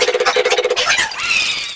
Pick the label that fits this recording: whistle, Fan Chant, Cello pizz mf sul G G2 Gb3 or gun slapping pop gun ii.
Fan Chant